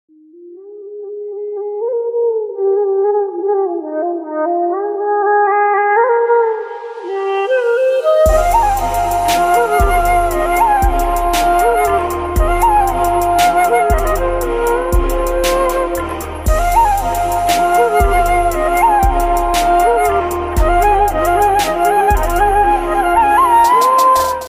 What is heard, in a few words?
remix flute mix ringtone; Swag Movie